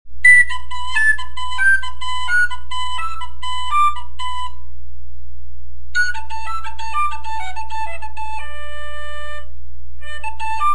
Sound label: jig tongue